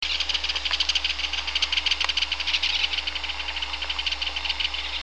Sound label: Martín Pescador Grande, Ceryle torquata, Ringed Kingfisher; Alcedinidae; cantos